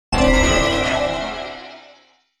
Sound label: Level up game